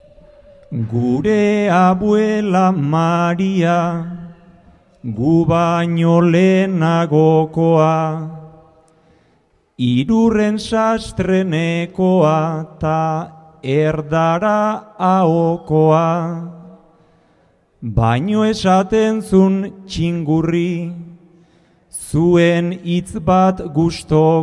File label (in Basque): agurra, bertsoa